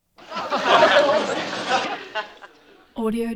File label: crowd small audience; prosoundfx